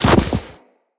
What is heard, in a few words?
Walk Deep Snow, Footsteps; Operator